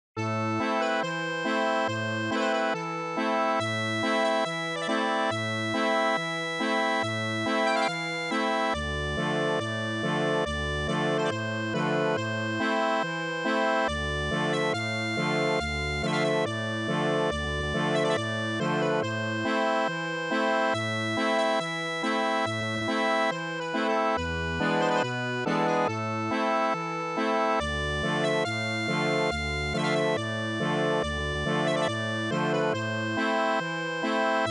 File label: Accordion